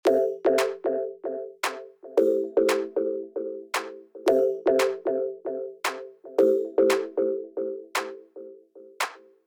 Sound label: clip dry